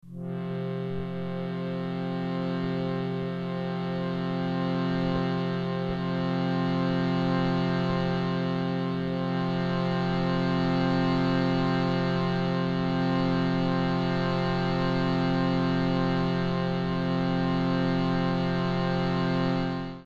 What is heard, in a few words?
Shrutibox gross; Shrutiboxen; STEINKLANG